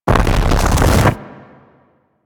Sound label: Eruption Build Up; Enemies; Sargas Ruk